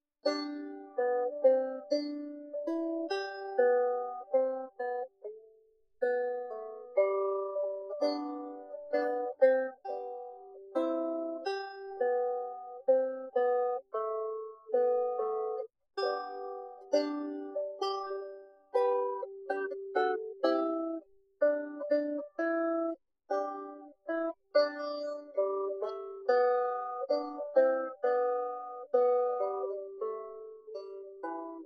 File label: banjo; WildWood